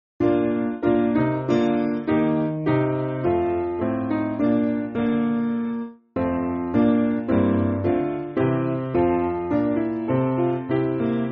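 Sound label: Piano, Music Backing Files for Churches; Awake Thou Spirit; Crasselius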